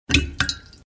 kapka ve sklenici

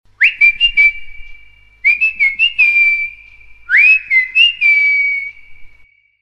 piskani

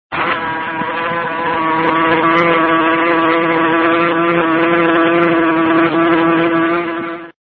moucha

